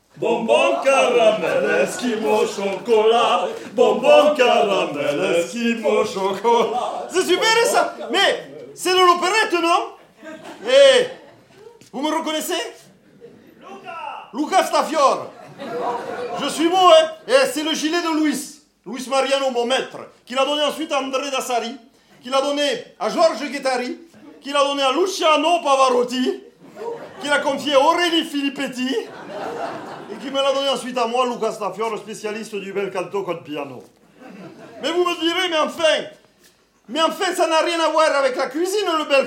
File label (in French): Le bel canto con piano (Live); Théâtre La Bruyère; 2019